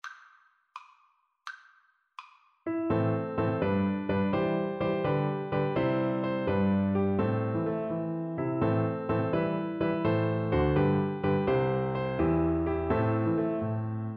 cello

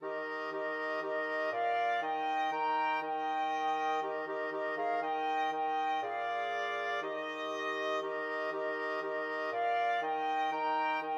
flexible mixed ensemble, 4 players

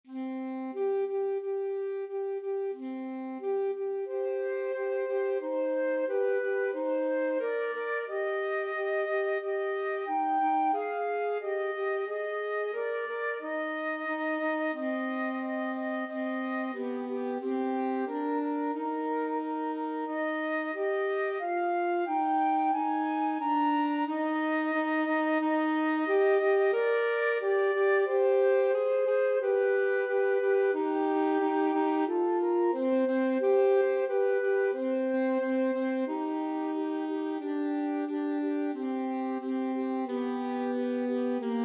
capriol pavane, flute saxophone duet

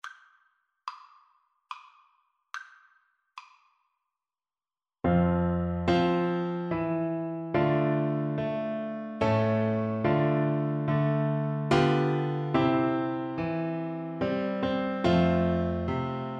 star spangled banner, Bassoon

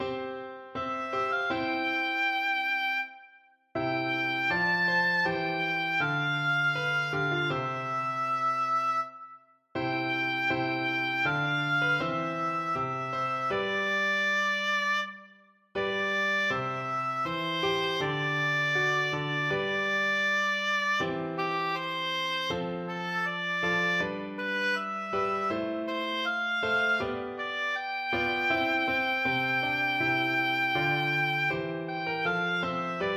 dowland come again, oboe

